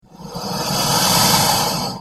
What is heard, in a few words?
croc snd; survival